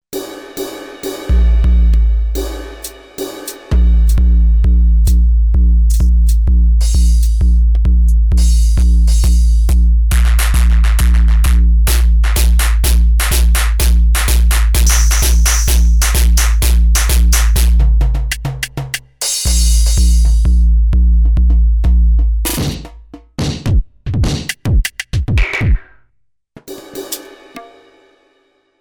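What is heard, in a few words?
Digi Hardcore Kit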